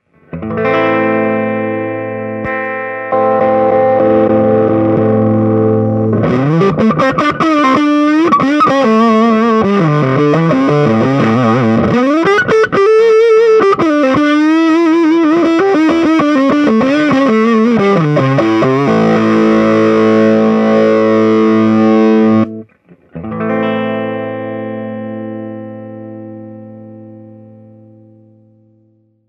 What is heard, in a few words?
sweep, pedals; gboy